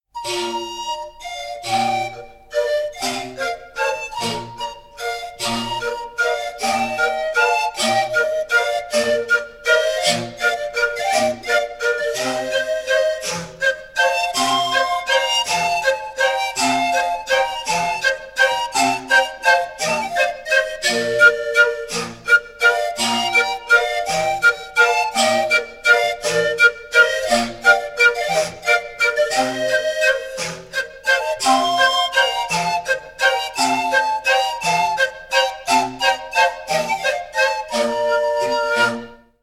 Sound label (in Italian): flauti di pan; brianza; la bela va in filanda